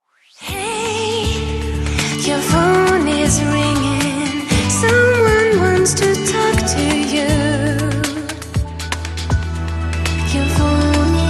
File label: ur phn is ringing, ringtones